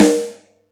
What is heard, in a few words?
Yamaha EX-5